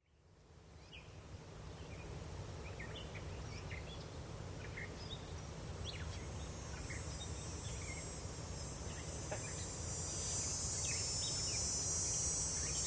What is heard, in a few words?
sleep loop binaural beats country day